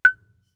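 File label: Marimba deadstroke fortissimo Gb4; MIS Pitches - 2014; Percussion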